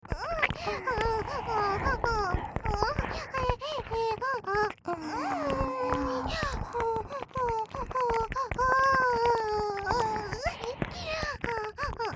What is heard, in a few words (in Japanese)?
両耳舐め+演技喘ぎ